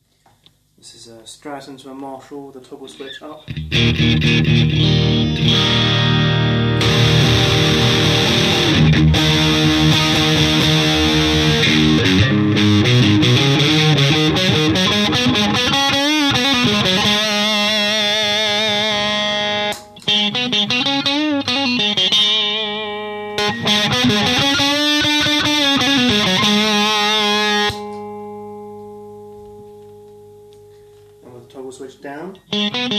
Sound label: TB Strat Marshall, Treble Booster; Treble Booster Mk II